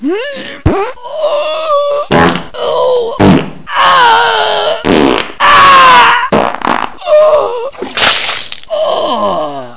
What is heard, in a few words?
Lot Of Farts